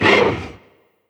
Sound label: HUSKED CRAWLER damage